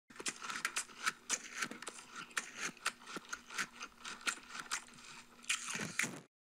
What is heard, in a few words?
Crunch